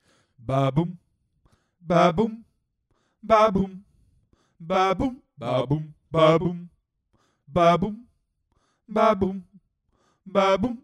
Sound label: Tutti